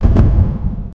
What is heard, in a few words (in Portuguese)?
trovão